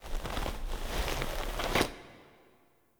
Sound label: Foley Struggle; Cinematics; Warframe; Orbiter Cradle